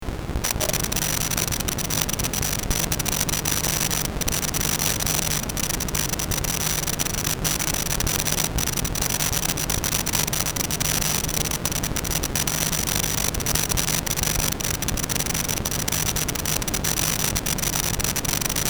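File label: electric yamaha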